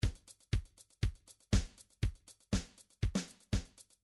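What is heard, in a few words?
drums paradiddle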